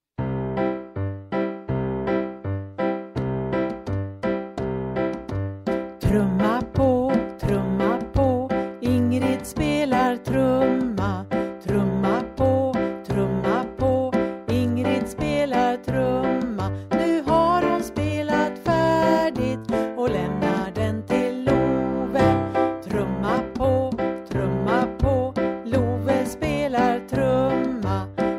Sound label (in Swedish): trumma pa